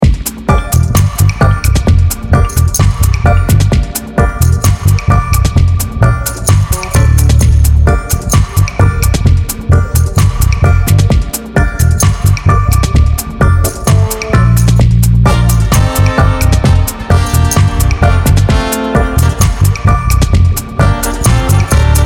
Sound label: Reggae, bass, groove; how to groove in seven different musical genres